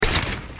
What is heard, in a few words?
click; shaoxiadiablo